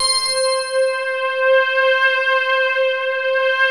synth bell; VFXSD

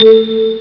super bell; Amiga Soundtracker Sample Packs

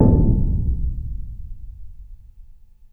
bass, 140 BPM, drum & bass; Ueberschall; Drum & Bass Resonance

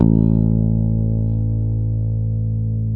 WAL BASS

